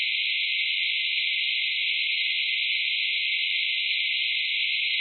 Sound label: unmodulated